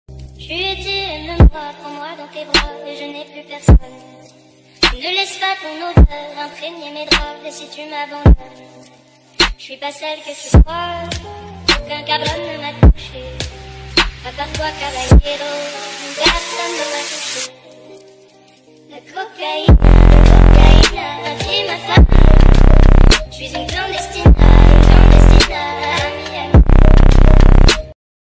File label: english remix ringtone bass bosted ringtone